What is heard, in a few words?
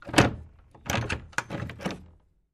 Door Wood Open, Close